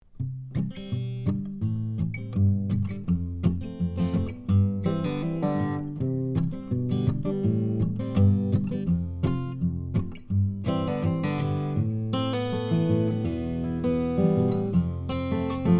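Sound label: C414 Finger pick; SANTA CRUZ OMPW; HYPERCARDIOID; C414 COMB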